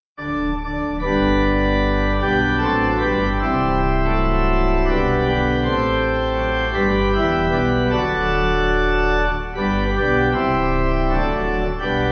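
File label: Pipe, Pipe Organ backing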